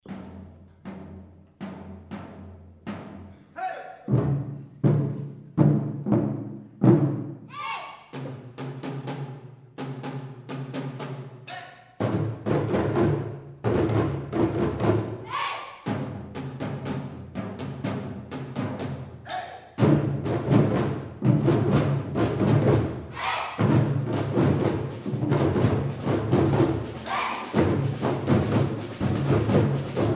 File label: drums